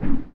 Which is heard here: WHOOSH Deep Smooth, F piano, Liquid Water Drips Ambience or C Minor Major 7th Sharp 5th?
WHOOSH Deep Smooth